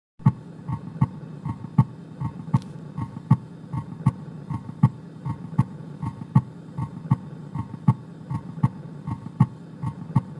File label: Midsystolic ejection; simulatorvzorky